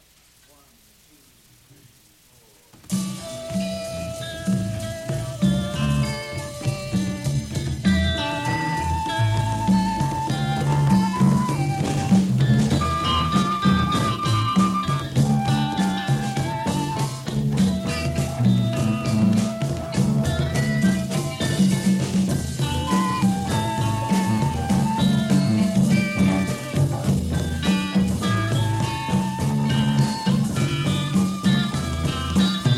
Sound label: Flute Instrumental